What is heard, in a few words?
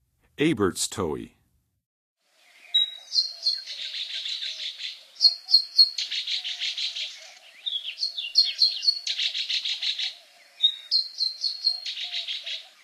Aberts Towhee, Songs of Birds of North America; Western USA; Western USA Songbirds